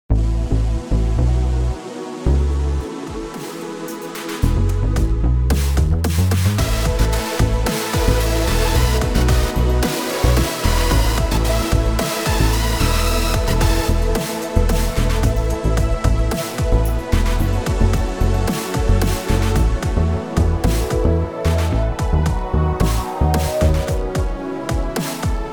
Fanfare; World of Goo 2; This Way Up

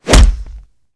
foot kick guy; Garrys Mod

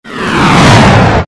Crash; RimWorld Biotech; TraderShips

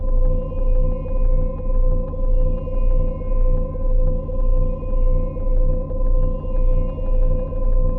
flashlight; Undertale Yellow; axis

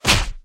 hit; Clicker Heroes

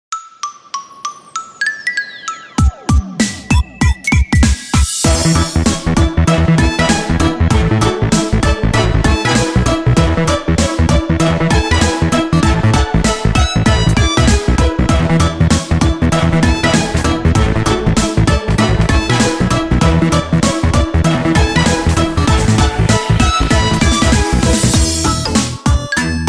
Upbeat Music

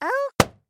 angry potato die; Clicker Heroes

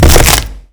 bonebreak; killmove; Garrys Mod

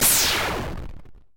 start; Super Hexagon

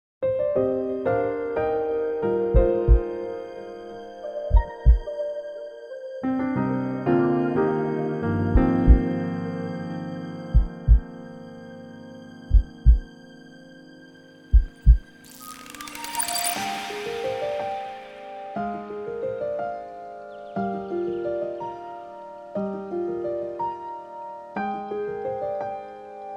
Innocence (Instrumental); 明日方舟 Arknights; 凯尔希•思衡托——Kal'tsit·Esperanta; 塞壬唱片-MSR

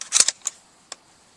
bullet Out Varmint; Project Zomboid

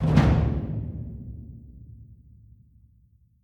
stab; Project Zomboid

